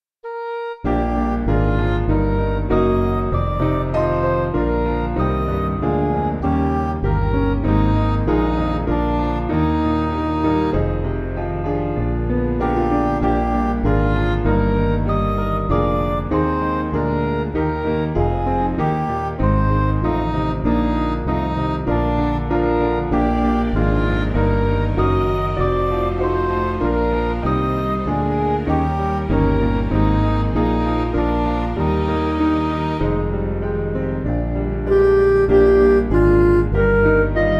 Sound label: Music Backing Files for Churches; O Love Divine; St Petrox